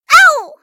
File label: stabbed; freak fortress 2; hat kid